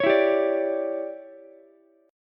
D Major 7th Suspended 2nd Suspended 4th D down Ukulele; DM7sus2sus4; strummed; JGuitar